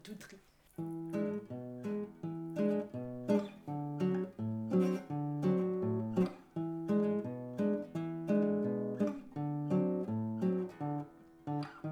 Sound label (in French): Jingle CONTES et RENCONTES; crieurs